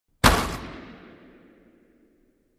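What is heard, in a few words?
Hockey Penalty Box Door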